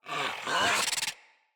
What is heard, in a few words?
Infested Charger Run Breath, Enemies